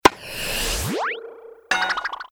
bounce

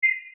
Safari fold close; Nokia; Audio themes

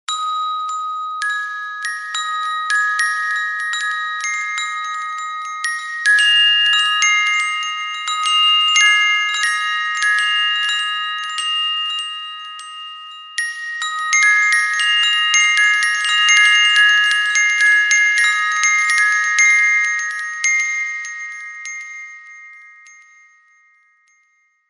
Windchime; HTC HD7

